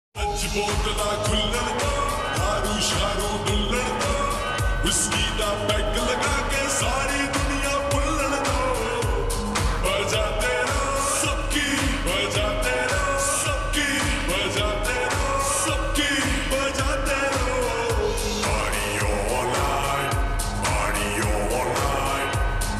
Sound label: lofi remix ringtone